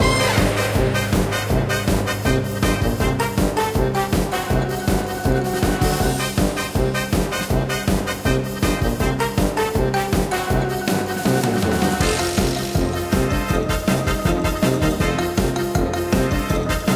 bgm battle

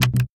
click button

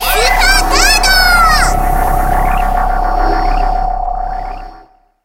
skill superguard

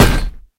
hit

